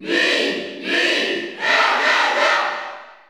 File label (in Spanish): Grito del público, Español; Mii; SSBU